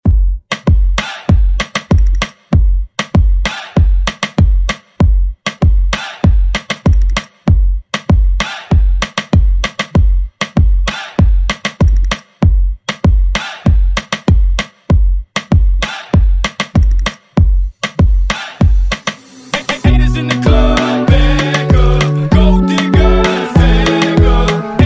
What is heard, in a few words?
Afrobeat Hype Intro